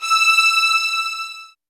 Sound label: SOFT VIOLINS